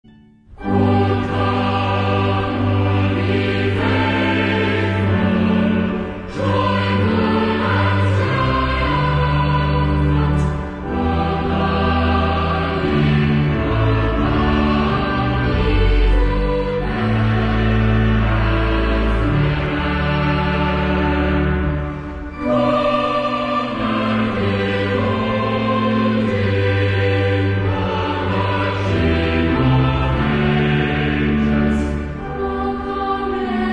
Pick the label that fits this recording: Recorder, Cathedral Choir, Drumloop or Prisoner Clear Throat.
Cathedral Choir